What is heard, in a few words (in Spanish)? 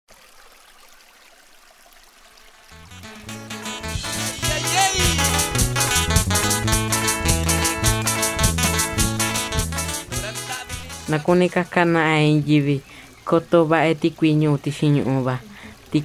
Campana Agua; Radio Chilate